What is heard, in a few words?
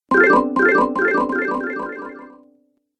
App Delay, ping; multimedia